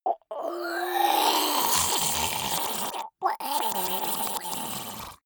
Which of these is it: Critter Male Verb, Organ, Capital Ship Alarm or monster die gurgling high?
monster die gurgling high